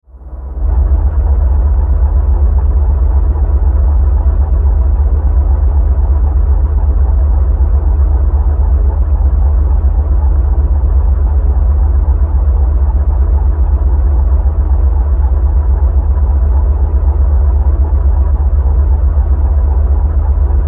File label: Bed Low Industrial Rumble Static Crackle; Blastwave; Beds